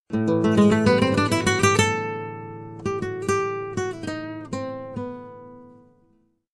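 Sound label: guitar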